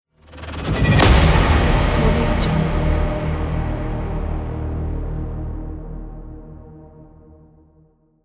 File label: dark cinematic logo button